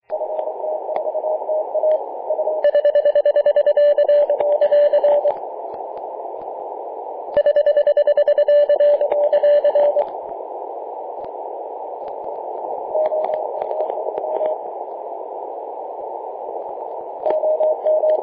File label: 3.523mhz cw; ft4ta